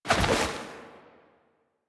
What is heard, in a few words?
Air Roll Whoosh; characters; generic foley; Warframe